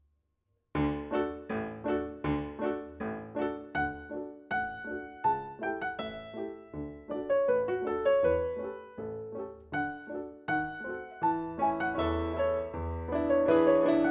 Serenade Full Tempo with Solo; Herbert

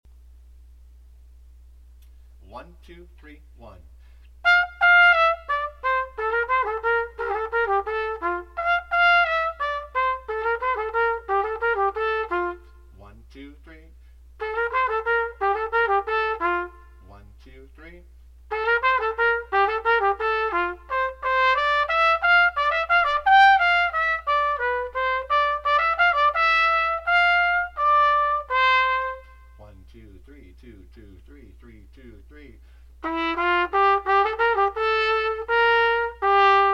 Passepied - solo only, Flute; Solo Accompaniments; Campra

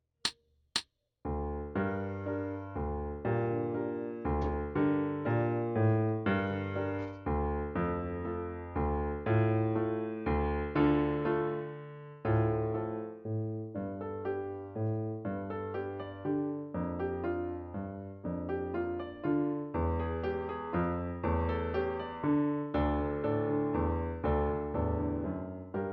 Waltz Full Tempo With Solo, Tuba; Kabalevsky; Waltz and Galop

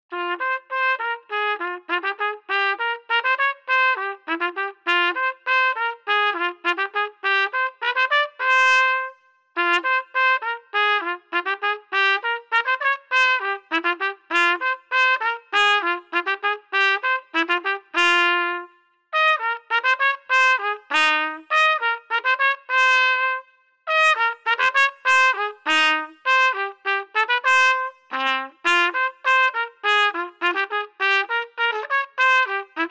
Solo Only, Baritone; Solo Accompaniments

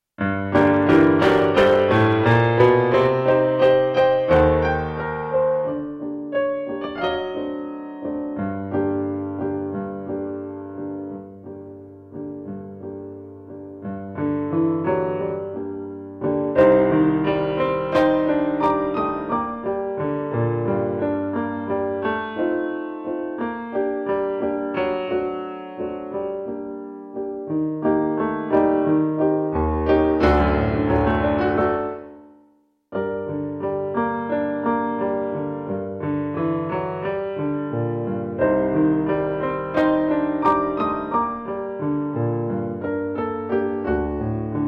accomp only, Clarinet; Solo Accompaniments

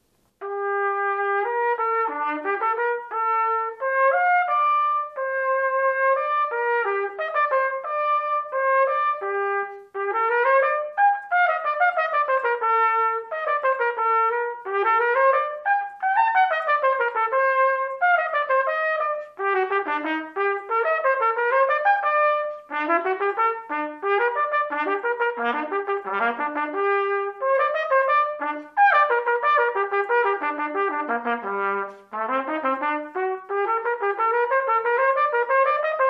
Etude, Trumpet; Solo Accompaniments; Brandt

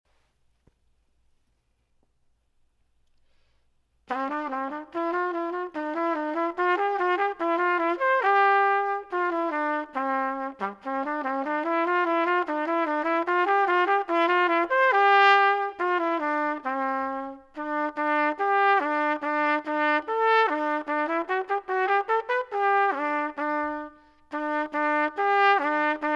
Alto Sax Solo Only; Solo Accompaniments; Berceuse; Kjerulf, Op. 4 no 3

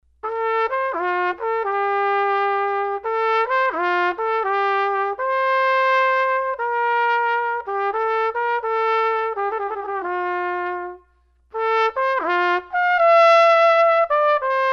Air from the Water Music - Trumpet Solo Only

